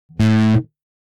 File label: Electric guitar heavy A string single pluck